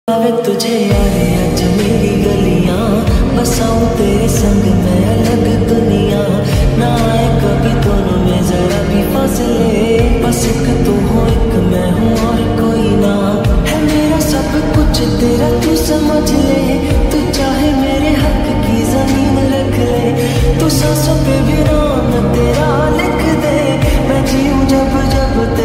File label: Lofi Remix hindi ringtone